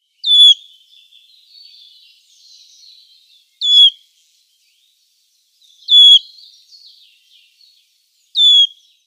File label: Wood Warbler calls